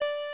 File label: Race Start Beep; Pac-Man Kart Rally; java